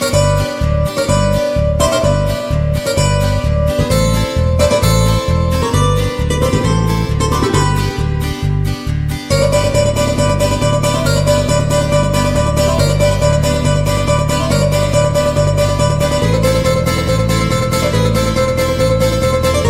Greek Guitar

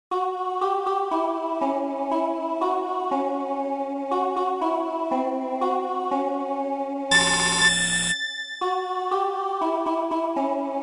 melody ringtone ringring

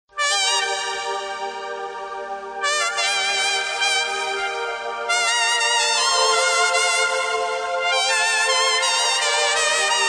piano

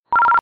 short ring

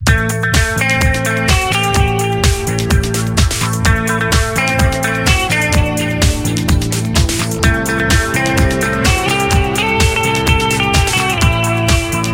guitar rock; alternative

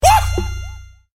Wooo

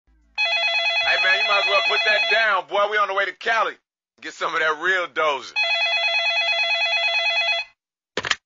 ganpati rap ring

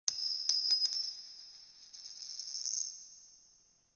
coin jingles; ringtones; sms

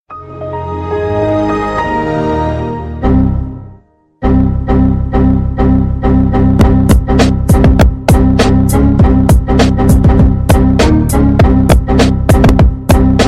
hip hop mix

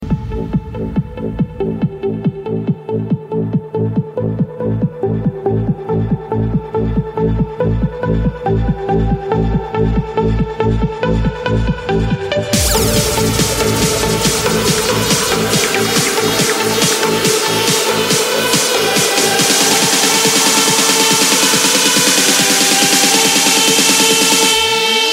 hard dance

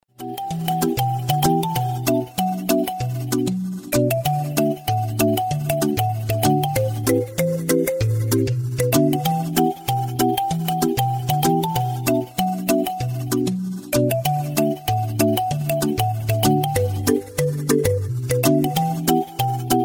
Classic Melody

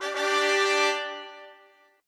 Tadaah Tutuuuh

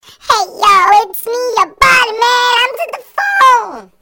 friend call; latino

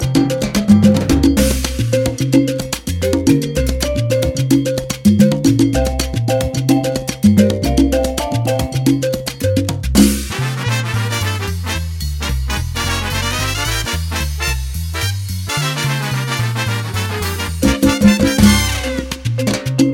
latin jazz ringtone; ringtones

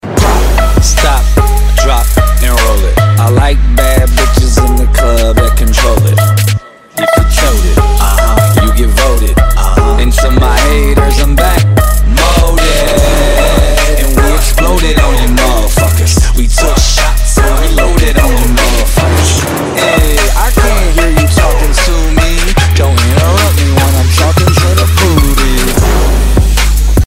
Drop Trap

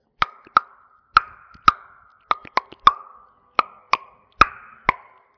Tongue Drum

